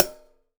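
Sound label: Hi-hat Acoustic closed; Acoustic and Electric; Polyend; Simulacrum Sounds; 1 Shots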